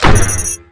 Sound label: advance; UT